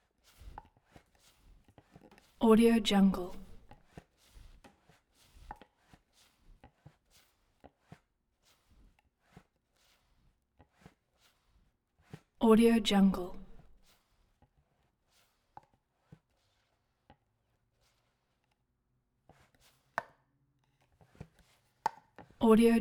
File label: fireplace bellows; collanderson